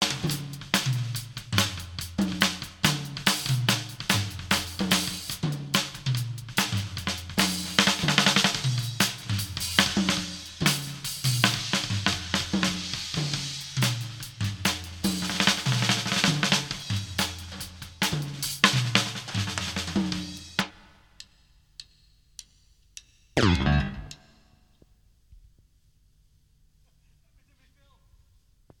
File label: Overlapping Drum Tests